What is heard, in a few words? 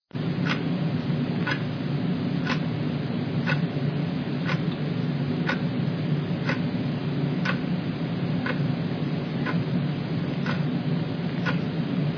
clock ticking